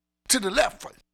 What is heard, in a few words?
Funky Mama Vocal, 70s Analog Funk; The Funky Mama; Sonic Foundry (Sony Creative Software); What it Is! 70s Analog Funk